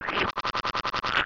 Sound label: thunder fire; lakitu; smw2